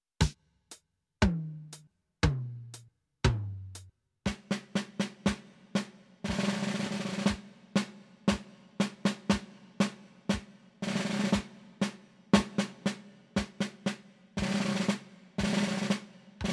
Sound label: Roll Base Tempo